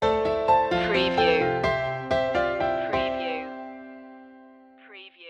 cheerful inspiration stinger

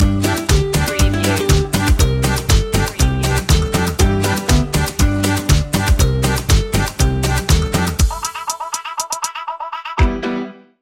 fun in the sun no vocals

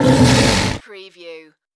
creature vocal

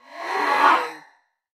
Robotic Scan

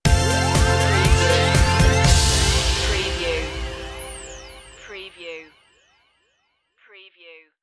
welcome stinger

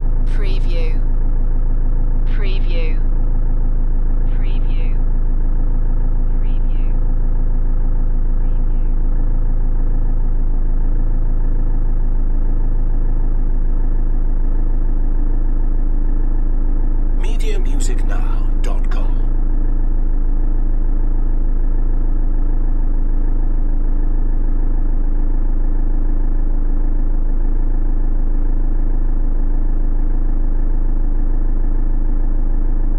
idle van engine

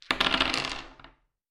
Dice Throw Five Dice On Wood Table

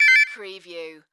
Multimedia Alert

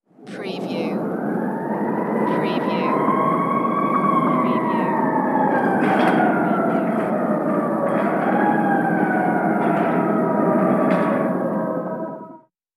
Ambulance City Sirens Distance

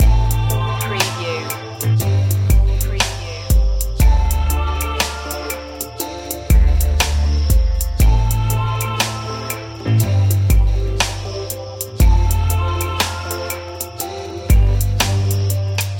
dark fashion loop

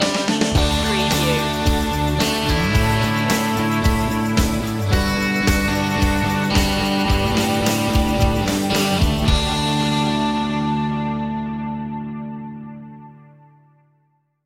pop celebration

